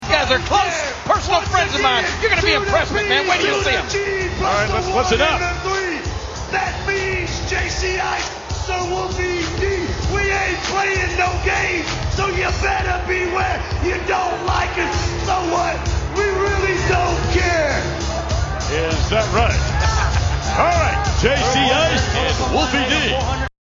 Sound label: rap; pg-13 rap on raw